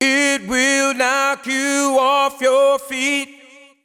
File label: deep house male, 125, A, vocals